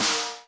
SD Brash Dist

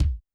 BD Dry

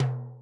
Timbales Lo Open

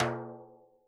Timbales Lo Rim

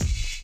BD Defness OHH

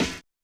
SD R&B

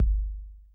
BD

